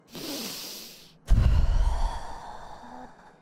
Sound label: deep breath